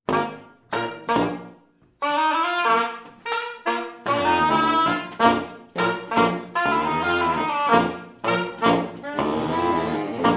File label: SAX MAFIA